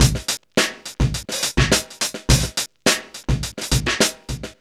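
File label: 105 bpm, Funky loops; Zero-G; Funky Elements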